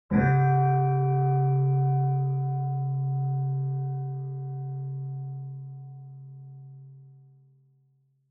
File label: Bonsho Low